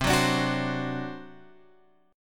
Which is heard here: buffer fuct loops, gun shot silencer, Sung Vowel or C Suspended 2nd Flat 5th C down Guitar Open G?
C Suspended 2nd Flat 5th C down Guitar Open G